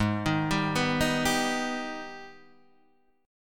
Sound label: G sharp Minor Major 7th G sharp down Guitar Open D